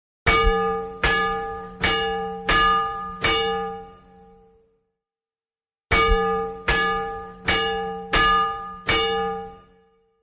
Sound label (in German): Stationsglocke